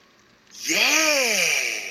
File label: yeaaaah